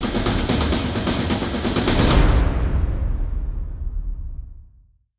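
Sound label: stinger big drum fill; transformers war for cybertron gamerip; ASSAULT TRYP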